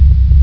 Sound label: water moan, ambience; poke646